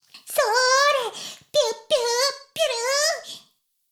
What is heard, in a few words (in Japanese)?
シコシコ音声; 古き城のドラゴニート2